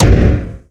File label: glass hit; Quake 4 Alpha; id software; strogg; tik